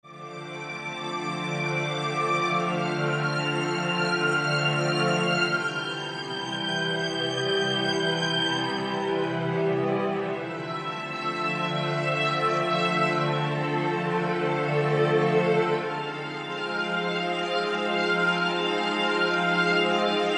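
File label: WIDE synth strings, Roland SC-880; Factory Patches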